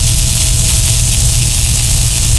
electric machine, air exchange; hl2

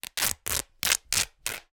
Cotton Rips, Torn T-Shirt; InMotionAudio

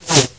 whiz, sniper; hl2

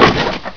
wood, debris

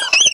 idle, headcrab poison; hl2

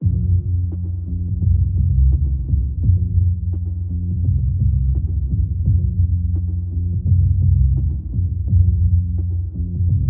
Music Spill; TheWorkRoom Audio Post

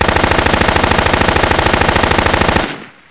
shoot long, sniper; hl2

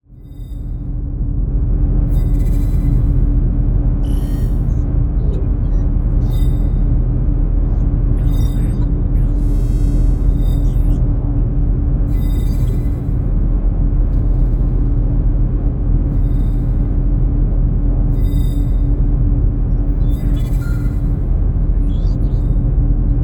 background command center ambience, SPACESHIP; BATTLECRUISER; Bluezone Corporation

